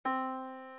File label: Yamaha Disk, piano sound